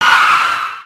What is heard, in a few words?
slide